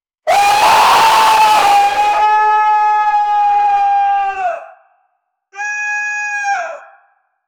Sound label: demon scream